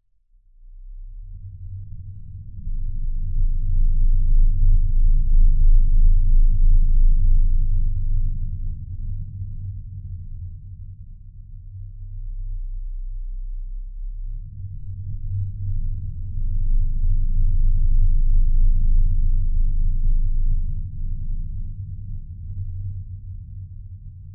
sound design lfe rumble air movement very low pitched med